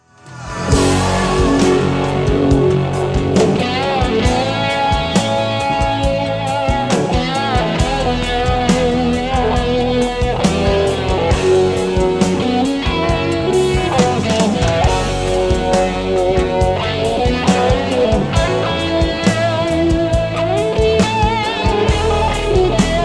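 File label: solo, guitar; Torino, Italy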